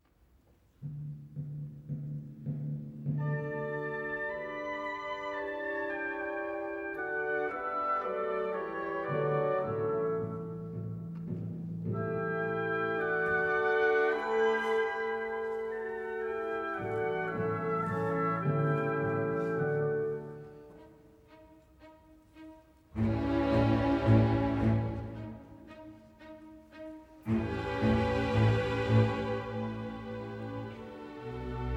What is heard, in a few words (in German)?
Beethoven violinkonzert